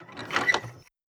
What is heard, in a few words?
rotating switch